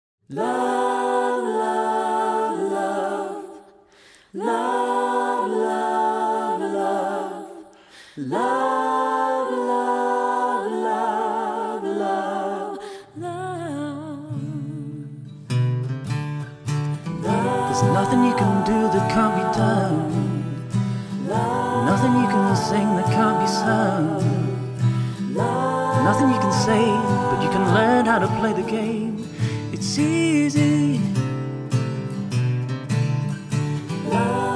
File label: Indie/Rock Choir